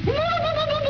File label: whoop